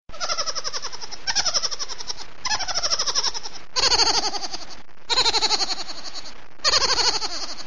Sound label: mouse laugh